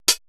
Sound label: 80S DRUM SET